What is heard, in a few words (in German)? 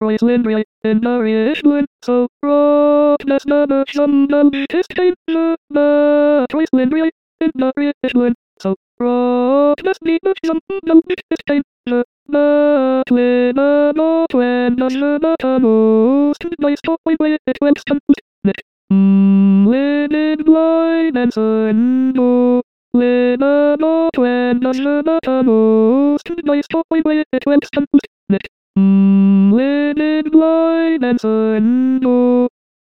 Zigeunerlieder ténor